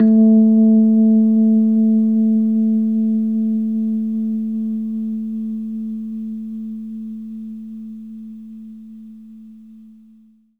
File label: Rhodes, Keys; 3D Sound; 3D Keys VOL-1